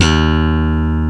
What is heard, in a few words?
ELECTRC BASS; OMI - Universe of Sounds